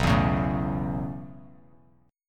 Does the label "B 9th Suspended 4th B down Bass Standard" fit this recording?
Yes